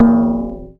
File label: A#, Synclav FM PERCUSSION; Big Fish Audio